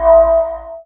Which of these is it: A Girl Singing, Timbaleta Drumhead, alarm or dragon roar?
alarm